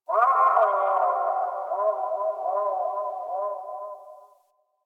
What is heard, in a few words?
Landscape Distant Birds, Ambience; Warframe; Venus Open World; One Offs